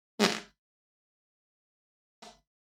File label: loud and soft fart